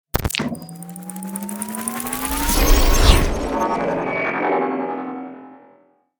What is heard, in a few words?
Futuristic trailer transition